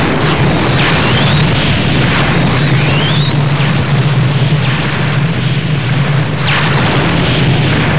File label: expl